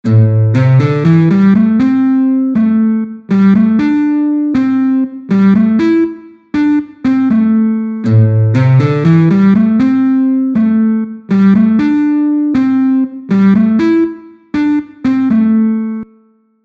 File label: Riff